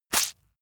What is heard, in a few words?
leather impact mud medium squeeze